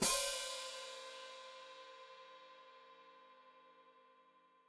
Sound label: Hat Closed Open, Hi-Hat